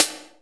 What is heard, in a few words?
Snare, Akai MPC500